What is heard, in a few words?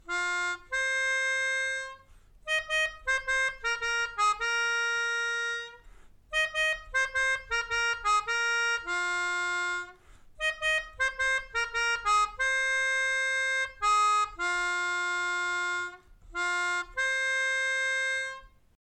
Brazil, Melodica